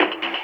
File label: LOOP, Drum & Bass, 135 BPM